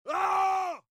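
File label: Voices male charge attack; low vol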